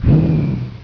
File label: FIRE